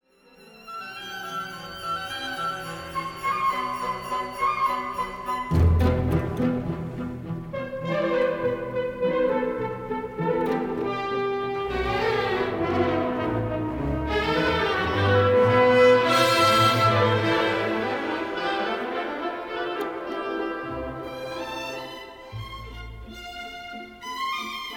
Świetnie brzmi wyraziste pizzicato smyczków i solo waltorni, zwróćcie też uwagę na drobne zawahania tempa; IV Symfonia Gustava Mahlera

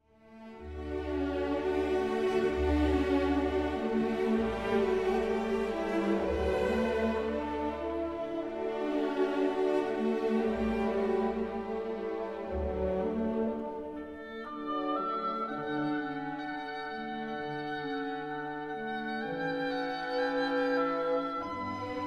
wejścia lirycznego tematu wiolonczel; IV Symfonia Gustava Mahlera

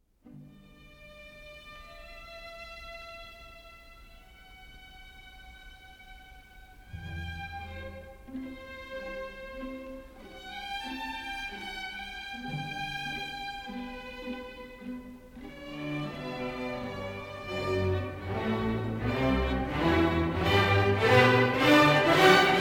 zakończenie jest bardzo szybkie i energiczne; IV Symfonia Gustava Mahlera

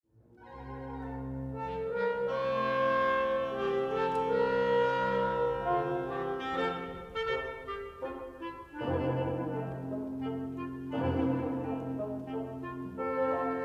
Przepięknie wypada tu solówka klarnetu; Jest tutaj bardziej kremowe, nostalgiczne i nawet odrobinę melancholijne; Horenstein; IV Symfonia Gustava Mahlera

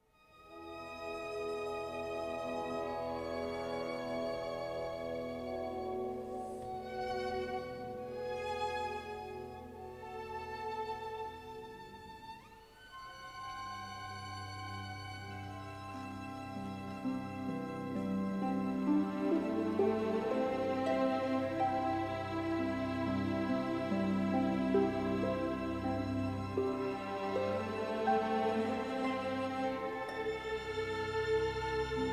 subtelna i arcydelikatna gra orkiestry w zakończeniu; Barbirolli; IV Symfonia Gustava Mahlera

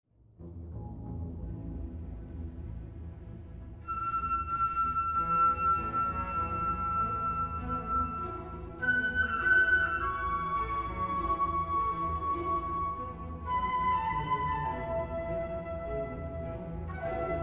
Kremowy, subtelny, ale jednocześnie bardzo przejrzyście poprowadzony jest temat fletów; Bernstein; IV Symfonia Gustava Mahlera

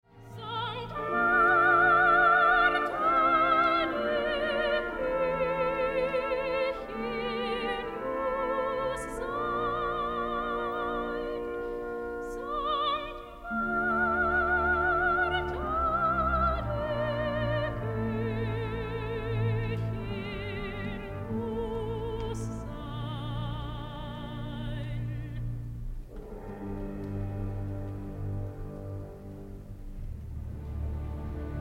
podkreśla brzmienia blachy, a zaraz potem smyczków w niskim rejestrze; IV Symfonia Gustava Mahlera

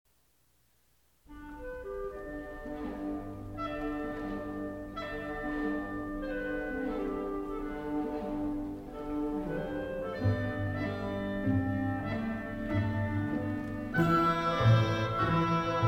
Świetne solo klarnetu i glissanda wiolonczel; Niebiańskie życie rozpoczyna się w sposób żywy i charakterny; IV Symfonia Gustava Mahlera

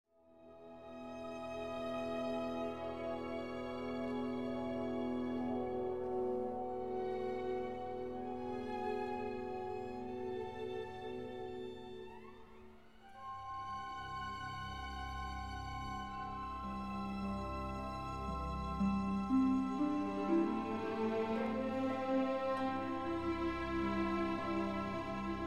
kremowe, subtelne zakończenie tego ogniwa; Bernstein; IV Symfonia Gustava Mahlera

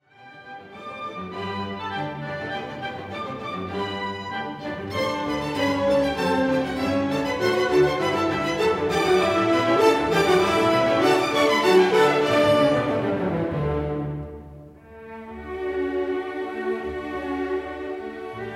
pięknego, śpiewnego i niespiesznego wejścia wiolonczel; Horenstein; IV Symfonia Gustava Mahlera

